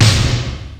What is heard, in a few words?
Fairlight CMI III Snare